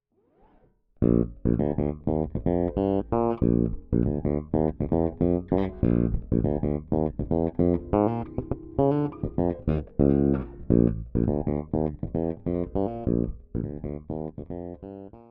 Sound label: aktiv bridge bass boost 450 boost treble cut; Ibanez